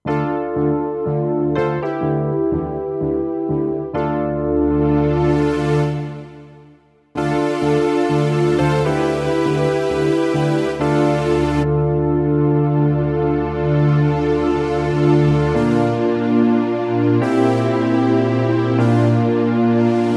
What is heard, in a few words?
Universal Comp